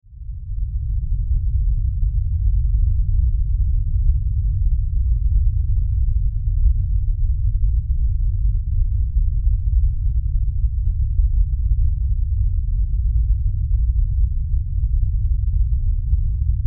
Impact Lfe Rumble; Impact Lfe Low Rumble Bass Long Deep Subsonic; Low Impacts; Blastwave

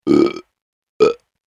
Burps; Burps - 2X Human Noises; Burping Sound Effects

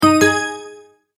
multimedia tone verified

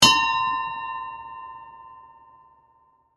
Bell Ship Vintage; Blast Wave

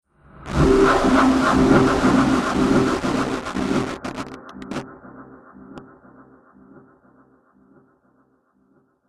Cinematic Hit Static Destruction

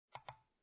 Office, Computer Mouse, Single Click; Computers; Electronics

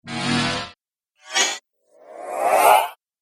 Whoosh Trio Pack; Andrew Fleming

